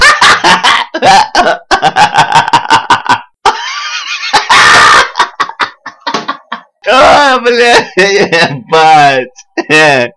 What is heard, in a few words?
attack loop